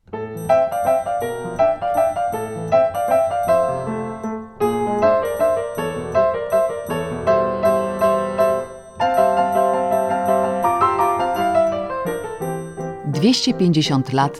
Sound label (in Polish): jingiel